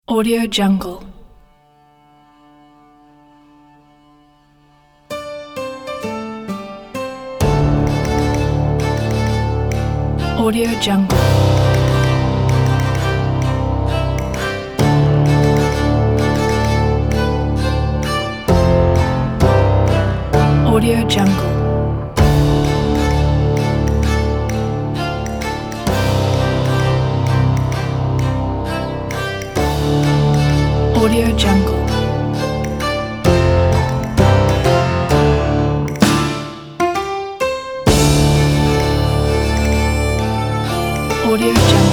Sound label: pirate theme